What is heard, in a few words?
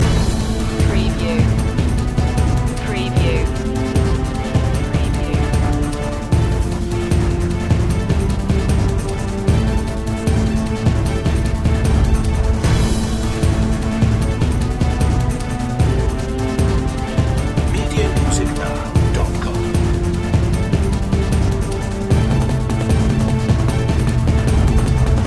quake loop